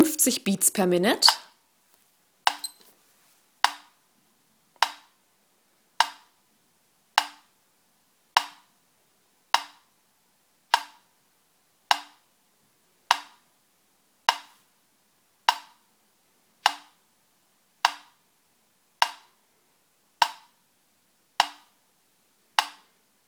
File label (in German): Metronom 40 BpM